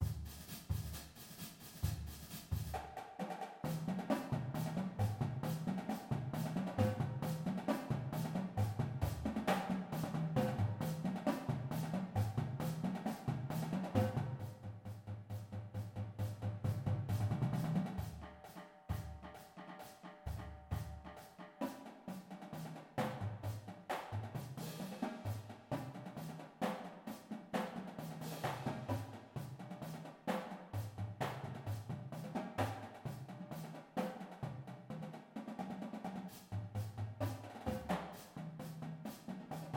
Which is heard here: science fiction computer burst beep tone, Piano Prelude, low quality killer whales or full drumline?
full drumline